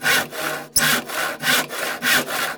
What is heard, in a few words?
HAND SAW